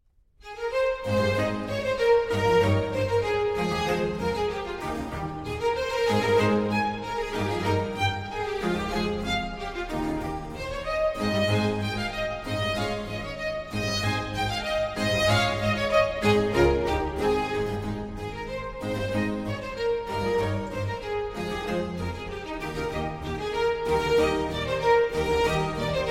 Concerto For Strings And Continuo In A Major, Allegro; Vivaldi; The English Concert